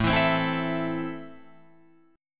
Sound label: A Minor, Guitar, Open F; Am strummed; 9,11,12,11,12,11; JGuitar